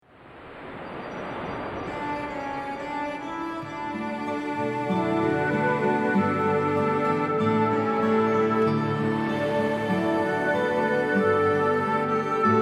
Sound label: crystal oceans instrumental